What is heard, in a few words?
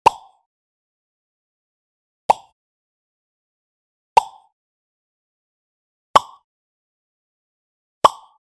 a cute and bright pang